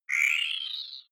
game start evil

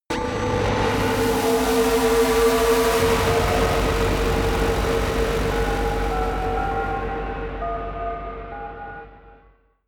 buzz crying bells high; musikelemente; drones

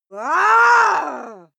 female aggressive scream; schreie; menschen

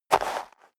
step dress boots gravel light single left; Footsteps; schritte

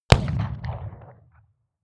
revolver shot 44cal remington 100m

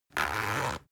zipper run; objekte; reissverschluss

